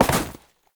Step Snow; Warframe; Oraxia; Foley

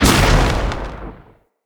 Lightning Slam Explosion; Characters; Enemy; Abilities; Sinecure; Banneret

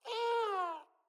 Kitten Meow Agitated; Catbrow

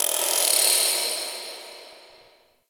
Moon Clockwork Texture Small A; Ambience; Warframe; Orokin Moon

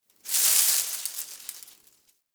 Derelict Tree Shake; Interior; Ambience; Warframe; Orokin Derelict

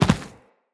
Infested Medium Land Default, Footsteps

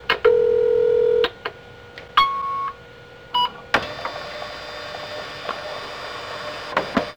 Office Fax Machine Misc

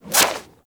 Serpent Ability Tendril Whoosh; Warframe; Warframes; Alchemist

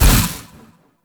Shield Stagger; Warframe; Warframes; Garuda

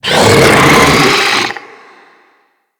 Infested Ancient Attack; Enemies

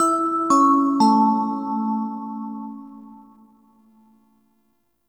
Train Room Open Jingle; Warframe; Entrati

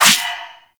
Shadow Melee Swipe; Warframe; Duviri

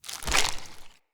Foley Claw Charge; Enemy; Characters; Corrupted Sproutfolk

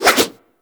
Axe Small Spin Swing; Warframe; Weapons; Swings

